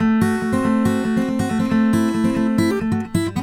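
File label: Country Guitar, Verse 2 Section 1; Digidesign; Pro Tools Essential Loops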